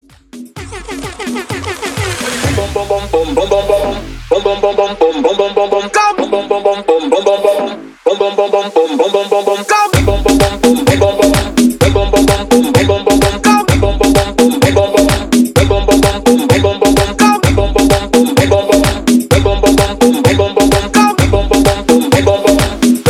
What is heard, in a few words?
Club Latino 128 BPM; Top40